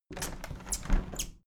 window handle plastic squeak close tilt regular; objekte; fenster

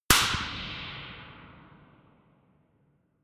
gun slapping pop gun; schusswaffen

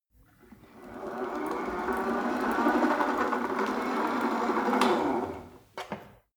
rope trainer pull sequence slow, fitnessstudio

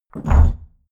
mechanical switch stone large push

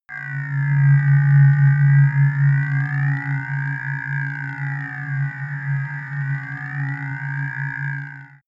sci-fi ambient scanner medium, drones

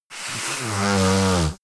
yeti nose blow strong; kreaturen

